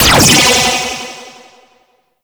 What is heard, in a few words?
Energy Gate Hit, Laser; Space Trech Run